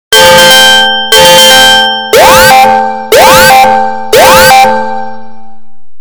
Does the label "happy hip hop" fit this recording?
No